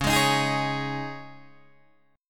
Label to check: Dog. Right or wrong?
Wrong